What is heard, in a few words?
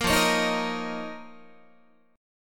F 7th Suspended 4th Sharp 5th F down Guitar; strummed